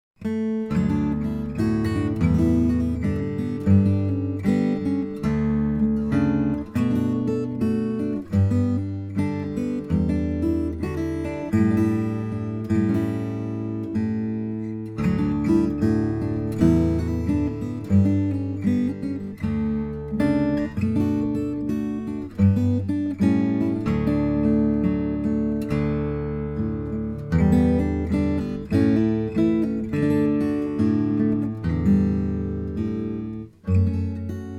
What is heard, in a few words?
new air